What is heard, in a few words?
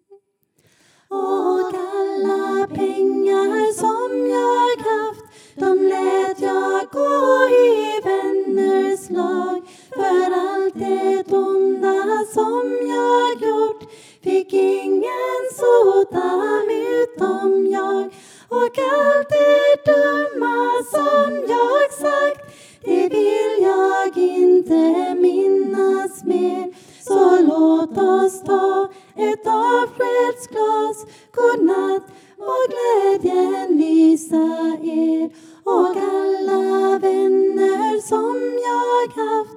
Baritone; Ett sista glas